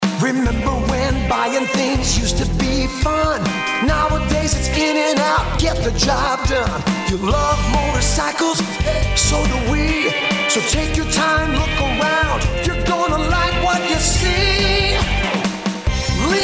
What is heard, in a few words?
Generic Jingle; Lee Custom Cycle